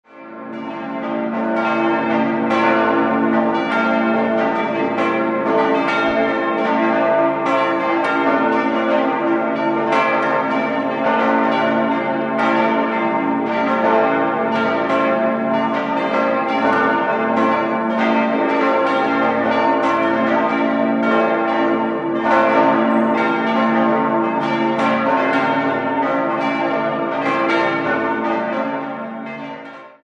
Amberg Mariahilf, glocken

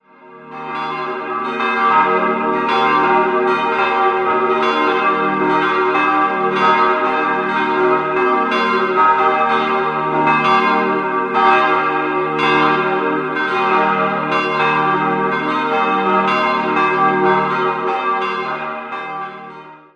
Ebenried, Glocken, Pfarrkirche; Pfarrkirche Mariä Himmelfahrt; zwischen Freystadt und Allersberg gelegen

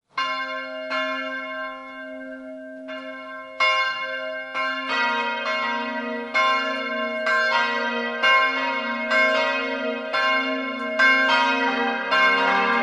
Eichenhofen, Glocken

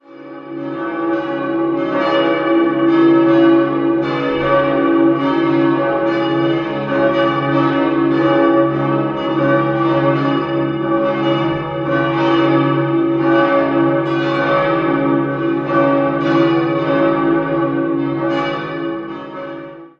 Bonn Endenich, glocken

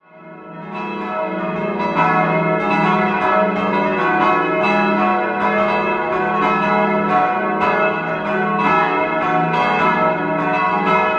Schemmerberg, glocken

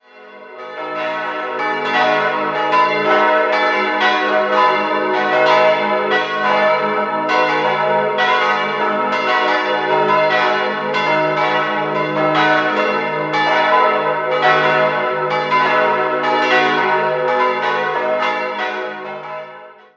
Ammerthal, glocken

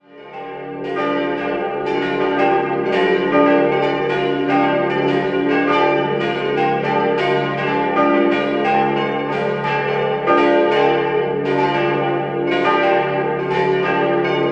Isen, Glocken

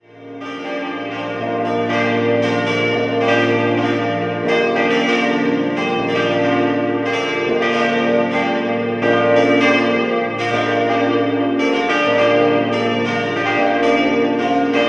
Mörschwil, Pfarrkirche, Glocken; Pfarrkirche St. Johannes der Täufer; 5-stimmiges Geläut: b°-d'-f'-g'-a'; Karl Czudnochowsky in Erding gegossen

